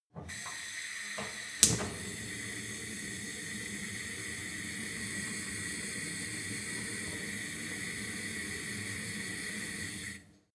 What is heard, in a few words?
gas stove hob; berufe